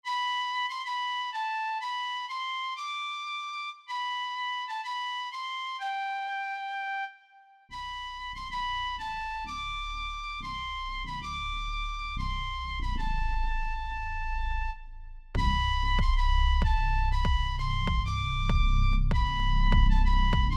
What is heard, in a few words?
Weee oooo weee oooo